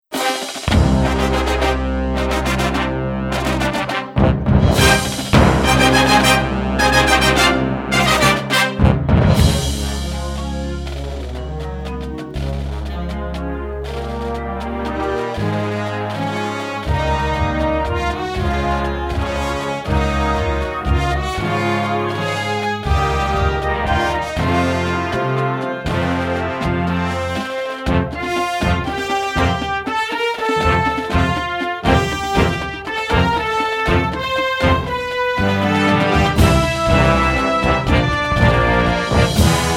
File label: Marching Band; Star Wars VIII